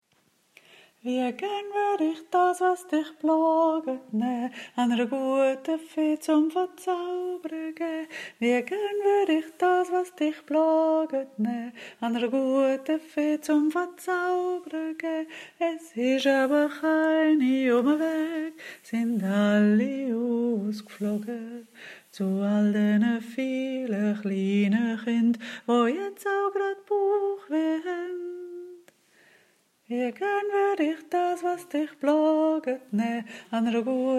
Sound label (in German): kind kinderschmerzenliedchen schweizerdeutsch